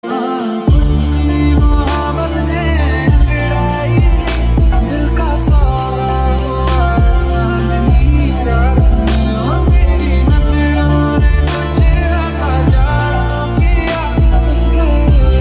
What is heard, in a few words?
Lofi Remix